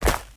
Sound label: footsteps; smite; god merlin